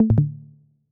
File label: stream user left; discord